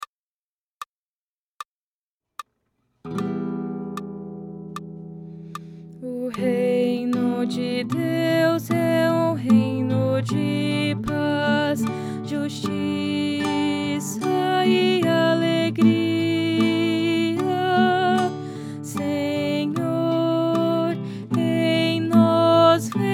O Reino de Deus Contralto

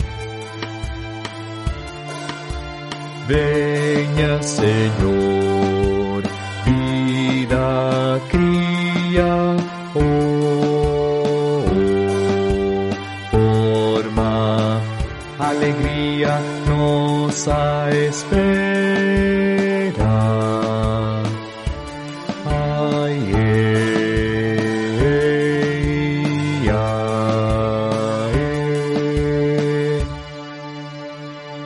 Jesus Cristo esperanca do mundo baixo

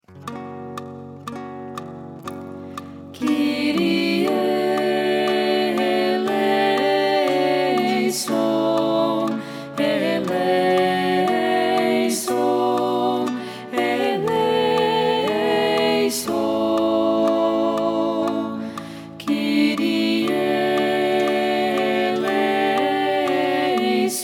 Kyrie Todos juntos; Kyrie eleison; JMJ

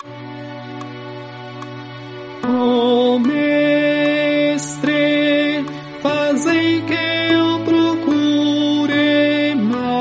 Oracao de Sao Francisco Alt e Ten

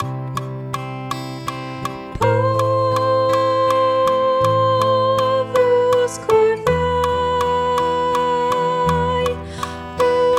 estudo soprano

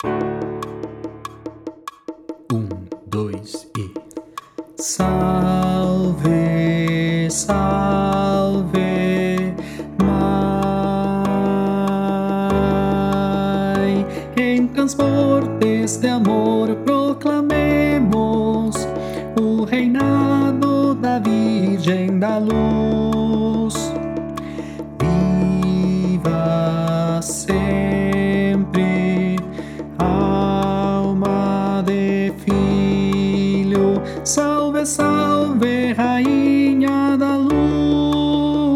Rainha da Luz estudo tenor

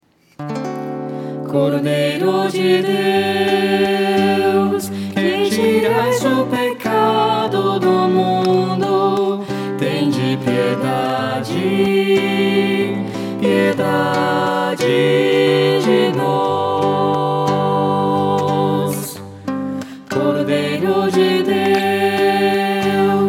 Todos juntos; Cordeiro de Deus

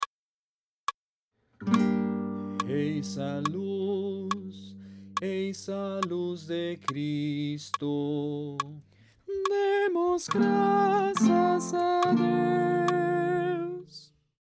Contralto; Eis a luz de Cristo

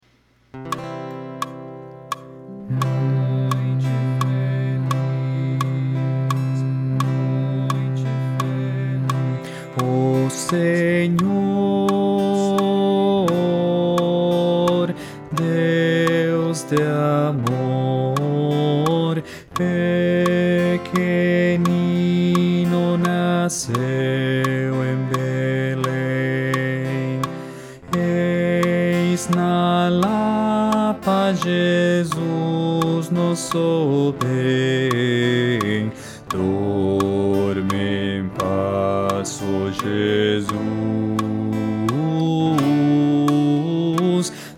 Baixo

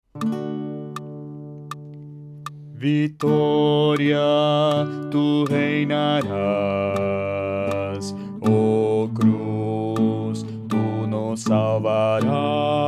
Vitória Tu reinarás Baixo

